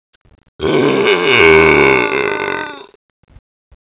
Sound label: burp